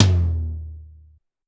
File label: Tom Lo, Pitched Up; Clavia DDrum-AT